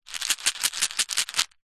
food candy sweets mint lozenges in plastic tub shake